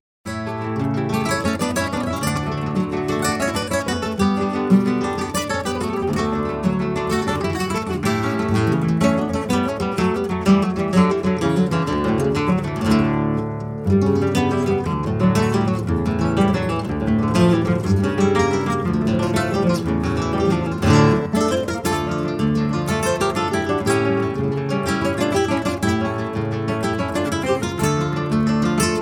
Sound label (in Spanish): Campanas Zapateado